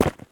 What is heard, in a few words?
Player Land; StarterAssets; ThirdPersonController